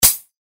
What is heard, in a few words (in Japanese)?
ガラス ヒビが入る